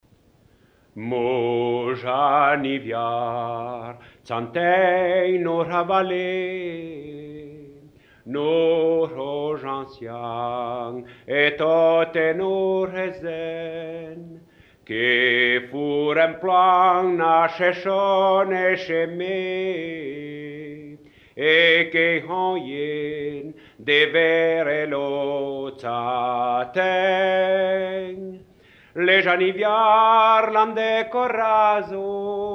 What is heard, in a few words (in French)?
patois de St Luc Anniviers chanson; patois valaisans; Mes Anniviards